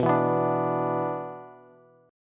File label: B-Minor Double Flat 5th, Bass; Bmbb5 strummed; JGuitar